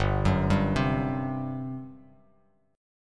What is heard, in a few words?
G Major 7th Suspended 4th, G, down, Bass Standard; GM7sus4 arpeggiated